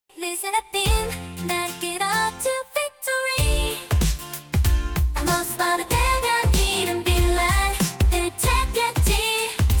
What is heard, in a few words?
victory sound